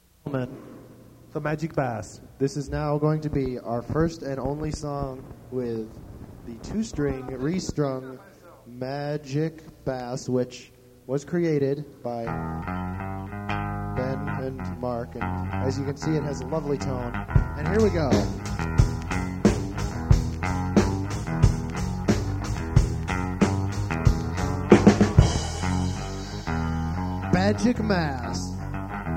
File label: Magic Bass